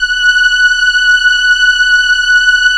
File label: SIEL, String Machines